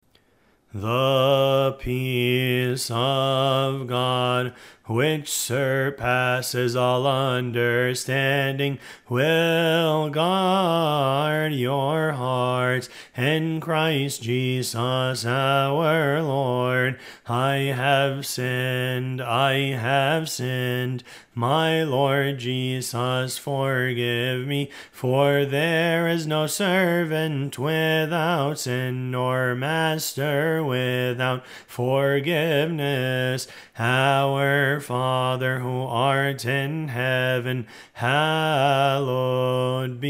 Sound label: weekdays gospel response